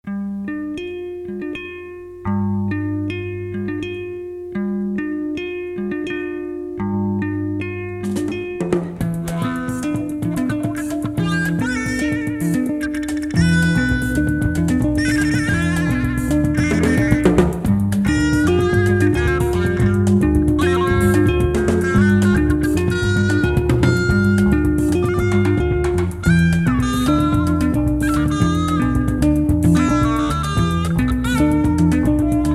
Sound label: Triple Echo